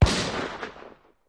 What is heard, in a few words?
Gunshots Single; fires